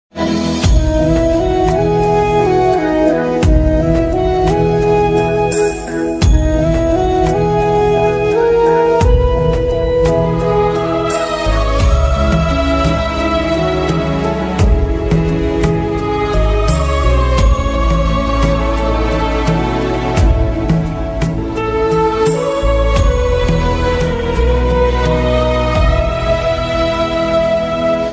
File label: Pakistani BGM ringtone